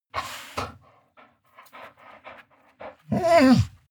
dog whine low; natur-tiere; hunde

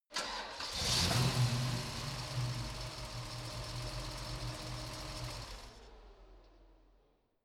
car camaro start rev once engine

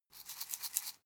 herbs shake light; berufe; gastronomie; soundpool

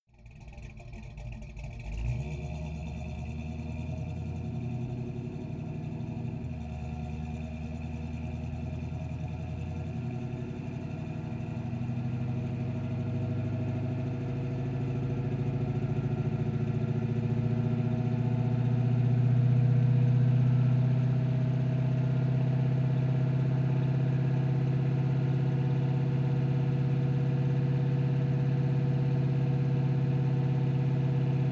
car camaro accelerate exhaust

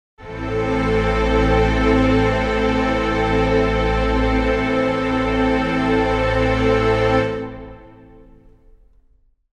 string chord a minor

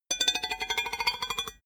metal rattle spin up; industrie wirtschaft; metall

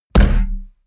heavy saturated, hits impacts; whooshes rises

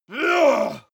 male groan strain

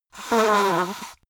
nose blow big; soundpool

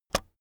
keyboard external space release, computer

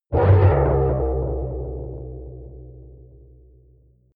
protective shield hit absorption

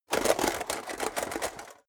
metal box small shake rattle; maschinen; metall; soundpool

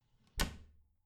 bathroom cabinet door creak close regular

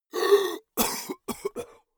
monster cough; comedy-cartoon; koerpergeraeusche

